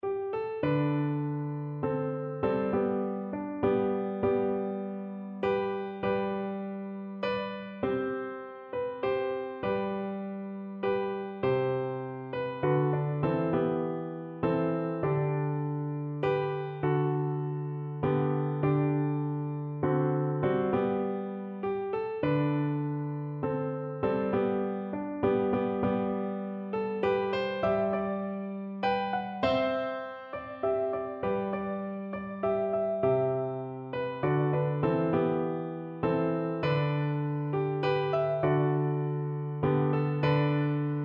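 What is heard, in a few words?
Shenandoah, marimba, Percussion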